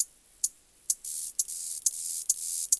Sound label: critter, wildlife; matmos hl